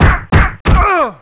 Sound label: Incorrect; World Of Sport Quiz